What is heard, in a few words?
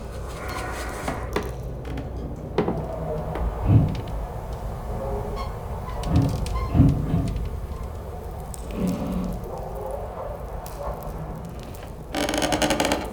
Under City Mill Room Ambience Loop, Dungeons; Beds